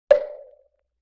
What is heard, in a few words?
Temple Block Hit Roomy; Vintage Cartoon; Drums & Percussion